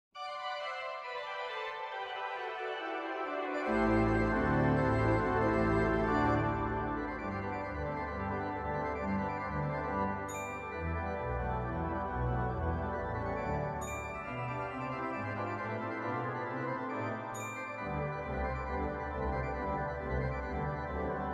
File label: The Typewriter